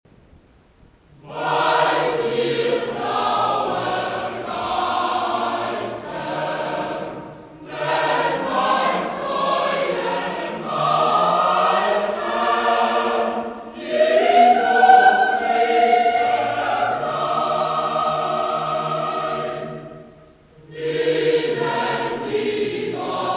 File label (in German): Choral; Jesu, Meine Freude